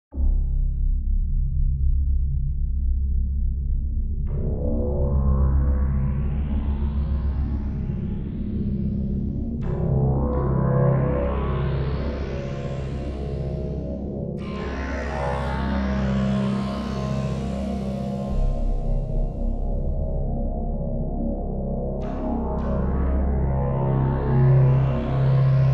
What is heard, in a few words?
Submariner Dreaming, Roland JU-06 Drone; SoundDiviner Project; Roland JU-06 Pack08; DigiD drone pack